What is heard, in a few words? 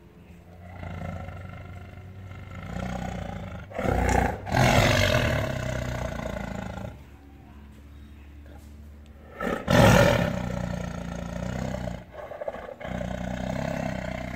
tiger roar